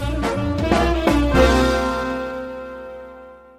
music stinger player respawn; Family Guy respawn

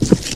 charge step; abrams; criminality soundpack

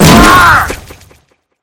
pillow hit; familiar; rem sounds

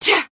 effort dash; female billy vo rework tifa ff7 remake

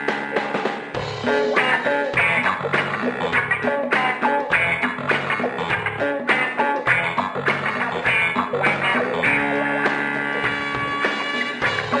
vault music

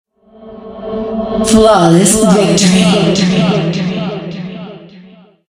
music stinger game over win; killstreak music

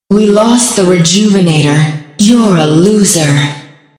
music stinger rejuv lost; killstreak music

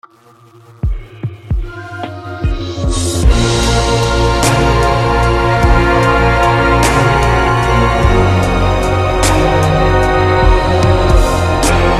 music match intro 160bpm; alternate archmother theme

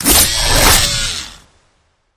claw cast; drifter; criminality soundpack

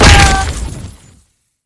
pillow projectile impact; familiar; rem sounds

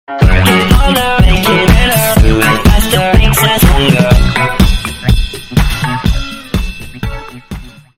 vault hit stinger; sinners

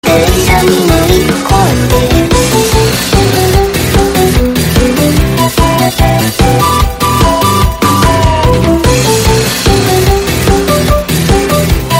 rocket barrage air raid, vocal synth; forge; mcginnis

